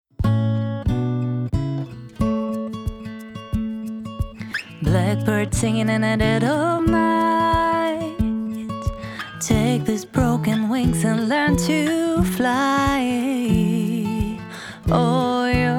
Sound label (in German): musikduo light lounge